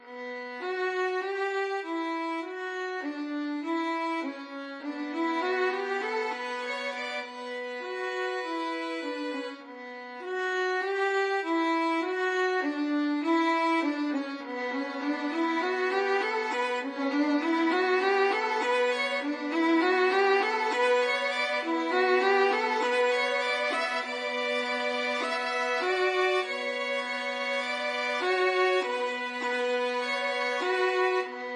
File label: submarine warfare violin duet